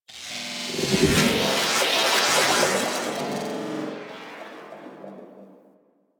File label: Transferrence Glitch; Warframe; The New War; Quests